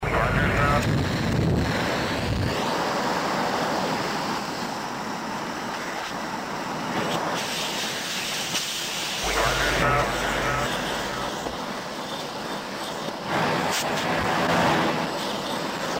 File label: music